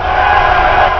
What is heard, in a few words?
CHEERS